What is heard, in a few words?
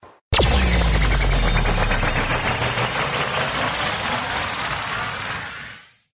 fast turn